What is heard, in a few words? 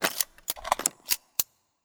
Harpoon attack Gun Foley; Legend of Keepers